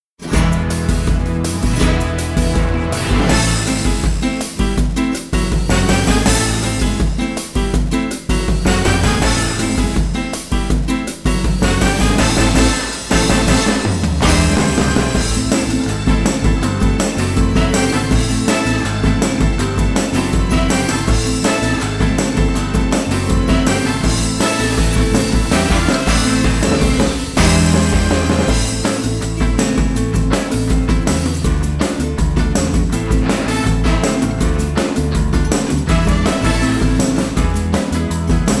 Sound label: escargot karaoke